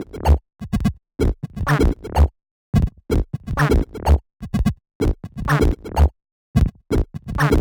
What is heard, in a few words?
126 D BASS, Funky Underground bass loops; Loopmasters; Funky Underground Vol 2